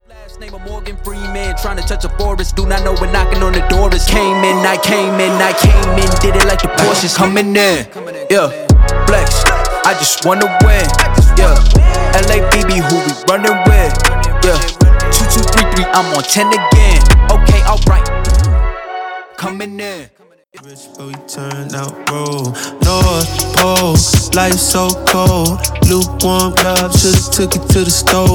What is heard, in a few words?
Rap